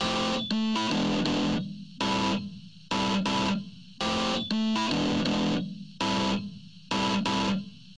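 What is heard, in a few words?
wet epiano, Multiband neural audio effect; Demo without amplifier; Wet-NoAmp; prism distortion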